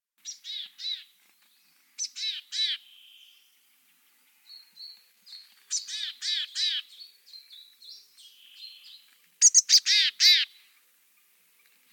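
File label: serogolovaya gaichka golos, grey-headed chickadee voice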